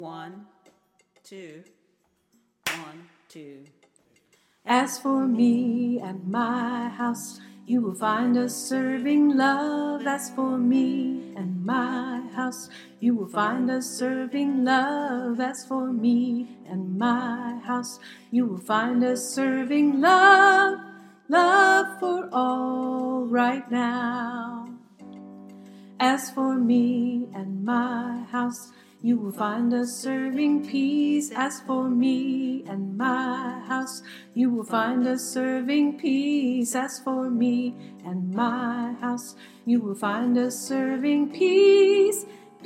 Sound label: soprano; freedom choir